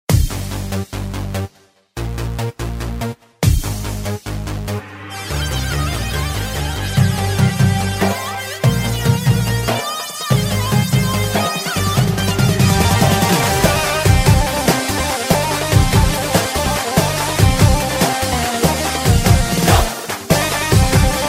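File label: Arabic dance music; arabskaya tancevalnaya muzika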